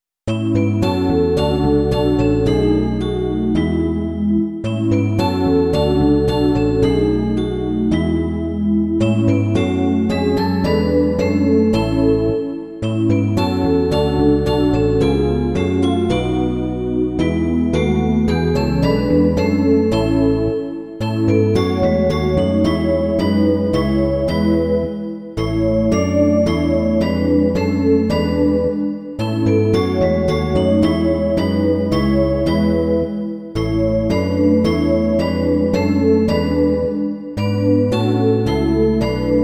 synthesizer a baskytara; Veselme se, věřící; Imaginativní verze

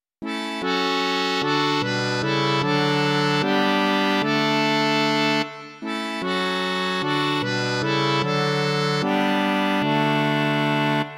akordeon; Pochválen buď svatý Bůh náš; Imaginativní verze

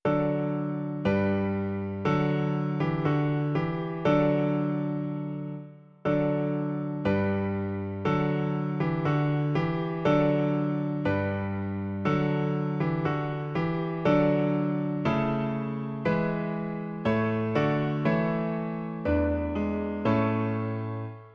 klavír, Klavírní verze

